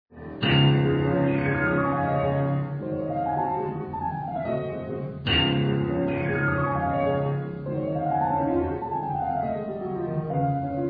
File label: old piano